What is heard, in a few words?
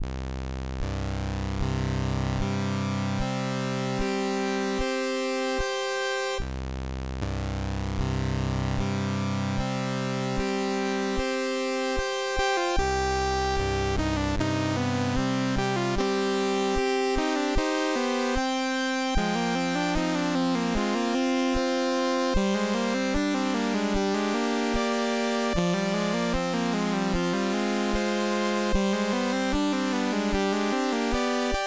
oriental music c64 style